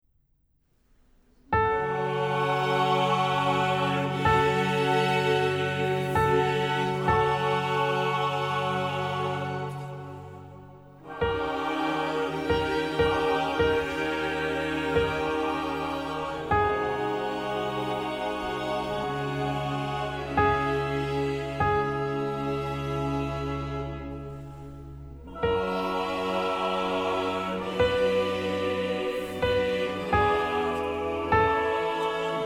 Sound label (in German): Sopran; Magnificat